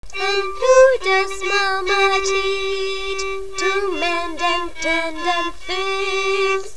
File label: Voice On Violin